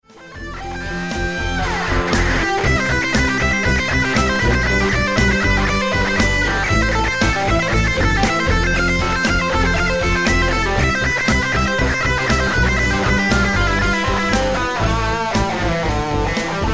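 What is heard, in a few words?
locked wah maj7 lick, guitar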